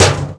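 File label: bouncy metal trashcan hit; bouncy objects; Tony Hawk's Project 8; XBOX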